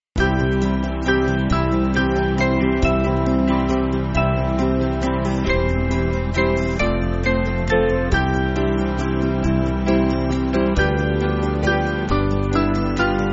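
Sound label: Band, Backing Files for Churches; Kirkpatrick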